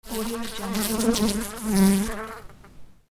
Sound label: fly buzzing; alexm76